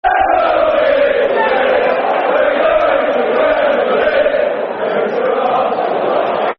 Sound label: Football Chants; Derby County Dcfc